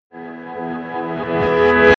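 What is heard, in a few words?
happy bright rise, drones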